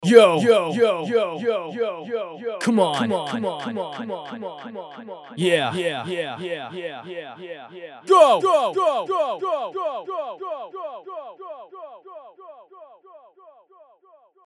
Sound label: feedback delay